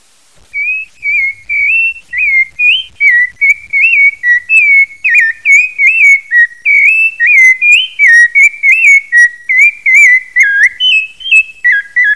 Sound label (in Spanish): zorzal; cs valleywarehouse